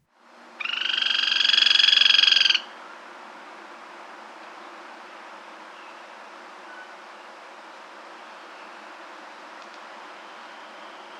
Roll, Call, and Drum, Birds of the Costa Rican Cloud Forest; N. America